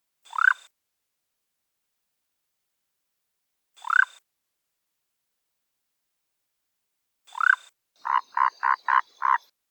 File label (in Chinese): 翡翠樹蛙